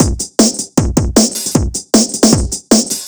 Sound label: 155 Dancehall No Perc, Drum Loops; Zero-G; Soundclash Nu Dance Electro; Acidized Wavs; 155bpm; Dancehall Fix